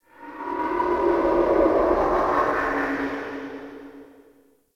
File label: Interior Cave Wind Howls; Ambience; Indoor; Warframe; Infested Eris